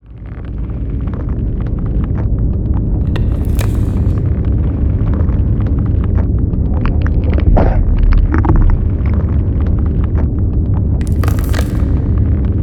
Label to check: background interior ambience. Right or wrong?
Right